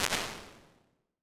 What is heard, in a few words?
Percussion, Impulse Responses, Gated Reverbs; Medium Spaces; Apple